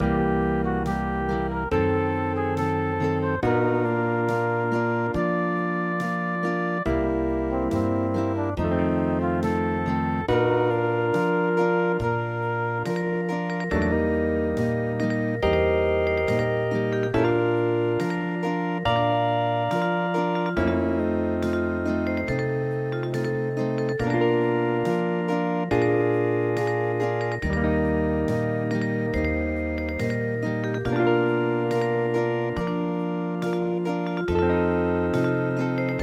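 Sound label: Hawaiian; PAPALINA LAHILAHI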